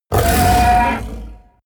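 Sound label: coloss shout loud deep, creatures; kreaturen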